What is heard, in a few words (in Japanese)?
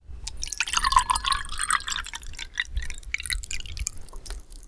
sosogu, water; real